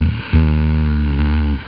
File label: humm; BattleSNDS